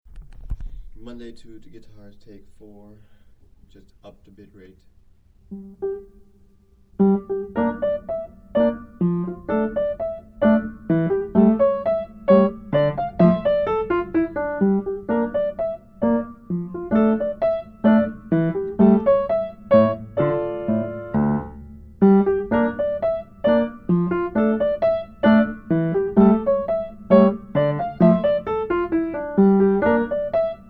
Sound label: Two Guitars